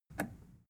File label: plastic button scratch release enable